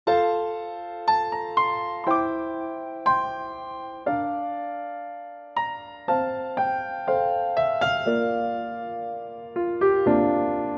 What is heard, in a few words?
piano; Pianotrak; key - F to Gb - vocal range - C to F; Gorgeous piano only arrangement; male key